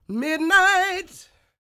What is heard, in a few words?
CROON, Vocal Blues & Soul MELLOW